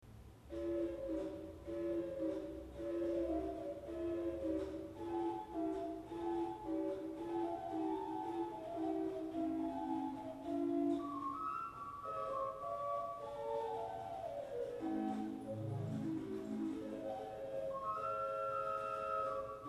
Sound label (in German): Gedakt 8, Orgelstimmen